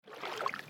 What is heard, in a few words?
water step; footsteps